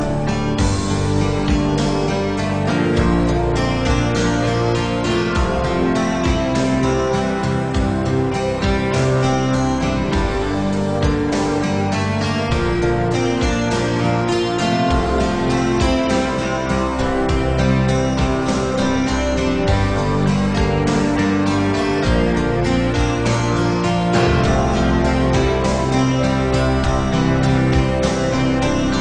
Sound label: relaxing tune